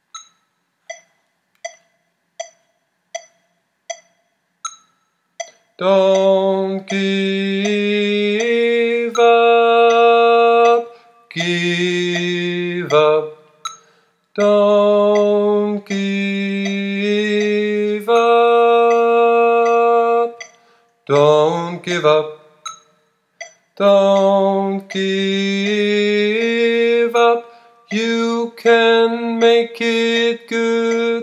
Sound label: Chorus Tenor